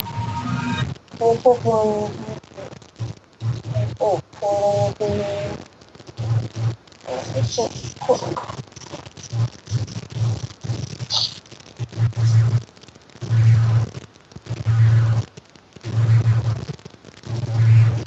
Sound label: Amibent Noise; Meme Mixtape